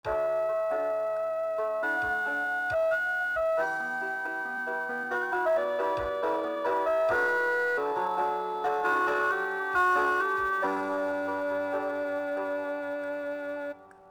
radio music; Soldier Of Fortune II Double Helix; XBOX; ambience

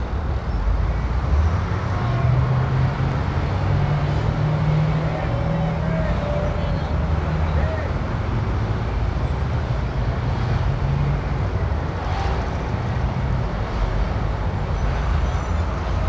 ambience; Street Hoops; XBOX

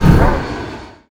negative; Totaled; XBOX

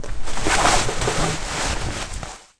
foley move, skater; Tony Hawk's Project 8; XBOX

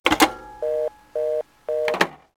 Payphone Use; Max Payne

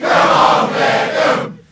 chant, Crowd; beckham; XBOX; David Beckham Soccer

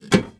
Place Metal; Myst III Exile

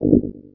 Landing Guide Fail

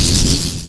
energy crackle

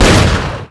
gun fire

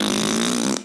fart

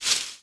shrub hit

